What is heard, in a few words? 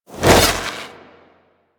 Trooper Melee Whoosh; Warframe; DE; Sentients